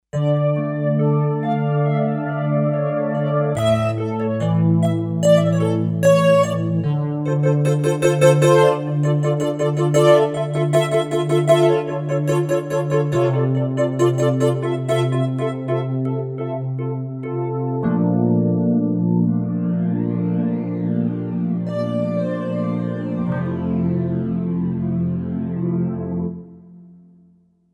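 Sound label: Velocity Square, Kawai K5000S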